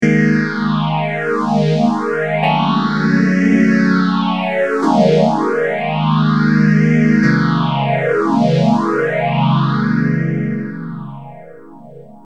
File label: Morley deluxe phaser